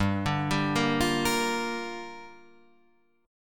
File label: G Minor 7th, Guitar, Half Step Down